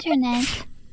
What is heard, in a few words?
Sneeze